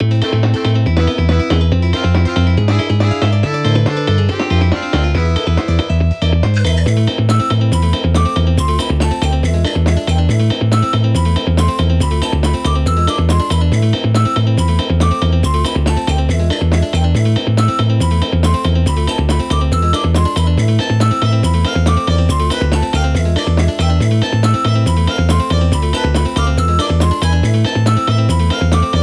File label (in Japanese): カントリー